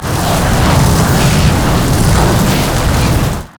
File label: frost scorched earth; LoL; shyvana